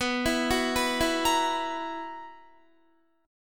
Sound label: B Major 7th Suspended 4th, Guitar chord; arpeggiated; 19,19,16,16,16,17